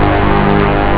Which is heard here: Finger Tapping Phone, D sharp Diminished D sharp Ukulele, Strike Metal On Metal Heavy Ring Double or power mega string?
power mega string